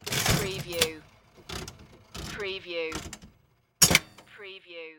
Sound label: Slot Machine Handle Pull And Wheels